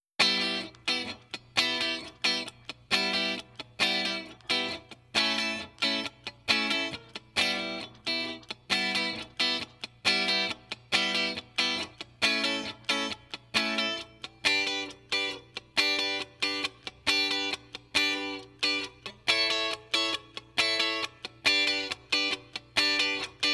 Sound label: 70 funk